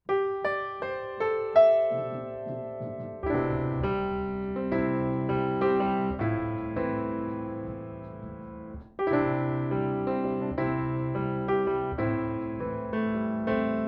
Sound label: Piano Ribbon